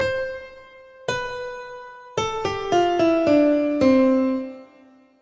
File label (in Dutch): gehoor dalend